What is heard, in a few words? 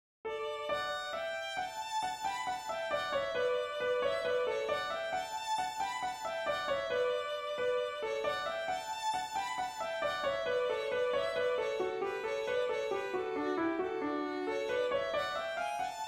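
reel, melody and 3rds 5ths; Reel de Joie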